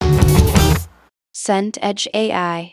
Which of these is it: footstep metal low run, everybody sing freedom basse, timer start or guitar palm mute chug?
guitar palm mute chug